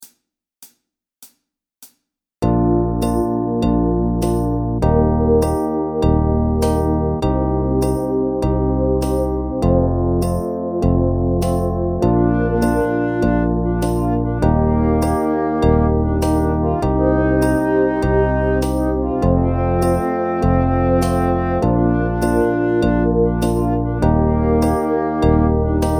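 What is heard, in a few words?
Drum Groove Fast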